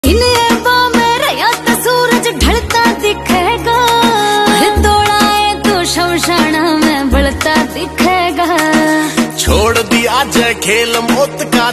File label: haryanvi ringtone